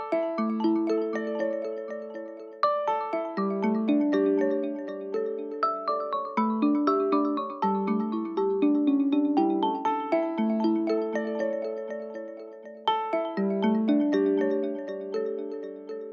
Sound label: Droplet Original Motorola Ringtone